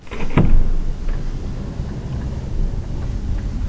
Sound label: fan